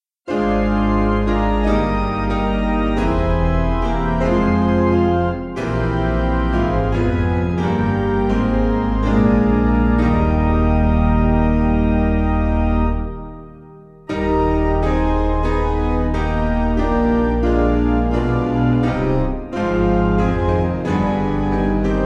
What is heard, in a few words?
Piano